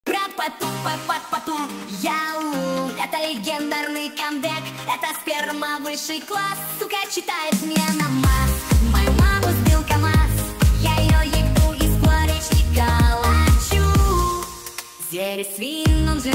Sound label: nightcore reverb